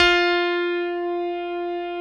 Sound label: PNO E.Grand; Roland; Keyboards of The 60's and 70's